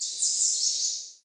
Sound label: ambience sanctuary birds